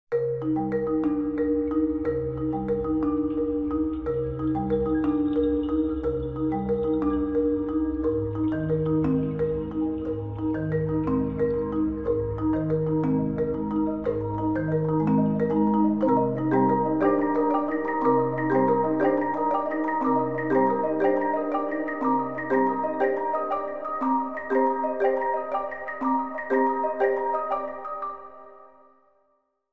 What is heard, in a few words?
Marimba Drops